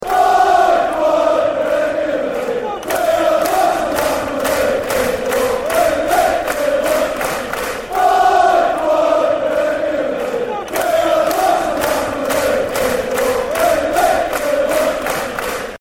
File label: Wolves Chant; Wolves Chant 1